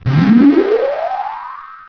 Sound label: boooomm; rumori